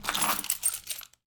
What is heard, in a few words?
Pickup Keys; SFX Kit; Foley - Misc